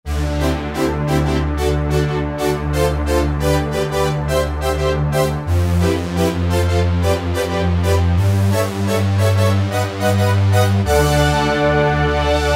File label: Octa Poly, Roland SRX-03 Studio SRX